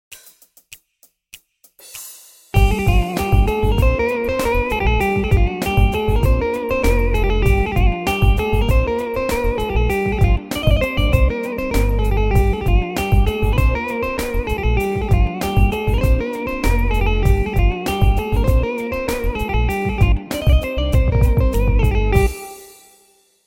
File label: yamaha tyros ii